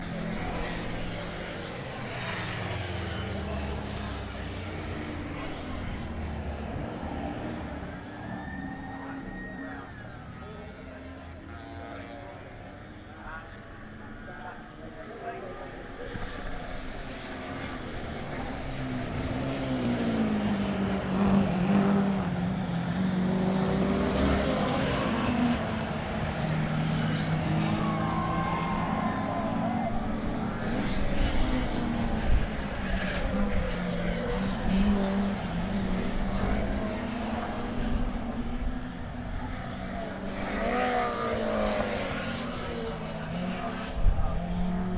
Pre 66 Touring Cars

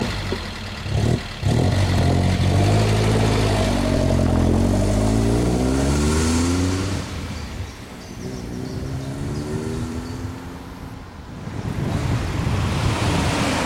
Alfa GTV Drive By

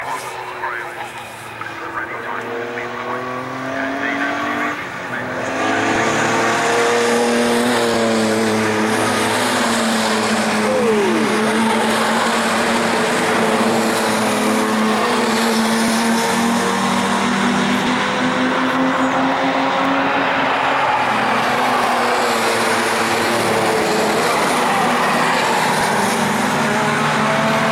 Jaguar Race

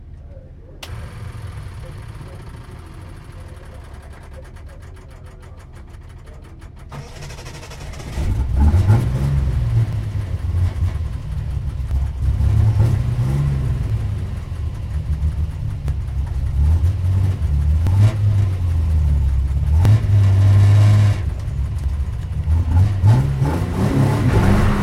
TVR 3000M Audio

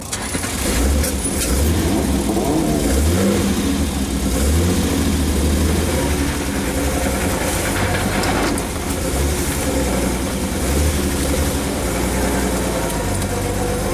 Austin Healey In Car

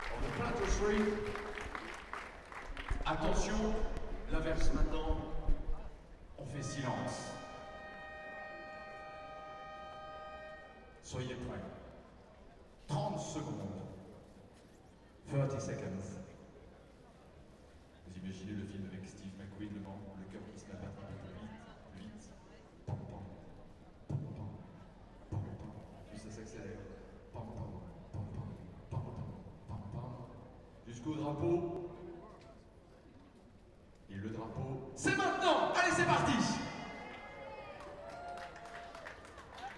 Le Mans Style Start

